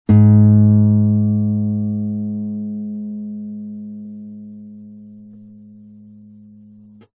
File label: guitar nylon lower string pick stop finger